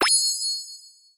eye shine

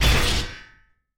robot footstep, machine

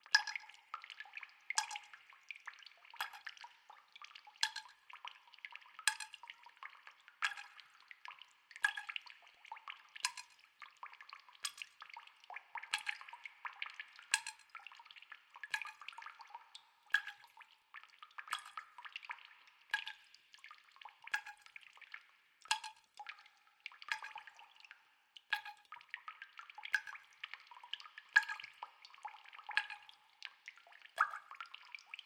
cave

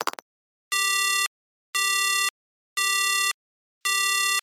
phone cut

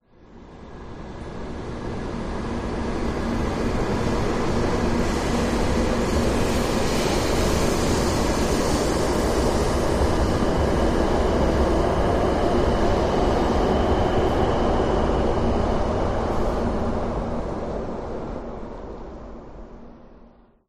bullet train start

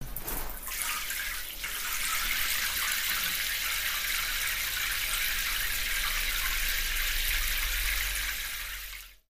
shower

